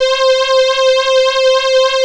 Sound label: Atmo Pads; Best Service; XX Large